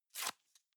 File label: box small tear apart soft; industrie wirtschaft; bueroarbeit